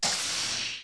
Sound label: tng door; TNGAmbassador